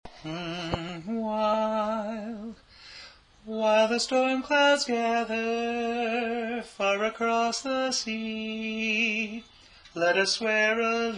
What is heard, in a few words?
Tenor